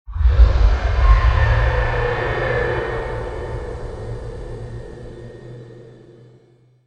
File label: Mountain Guardian Breathe In DISTANT; Journey